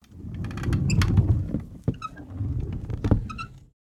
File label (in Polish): SFX armata jedzie do tylu; Apocalipsis